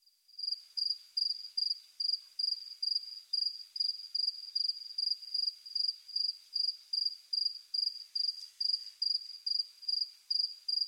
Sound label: Crickets Single Foreground Early Morning